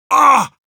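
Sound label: pain sharp, Russian; Heavy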